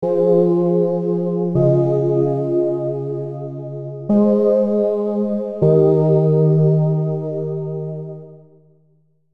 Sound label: F Minor Prog, Chord Progressions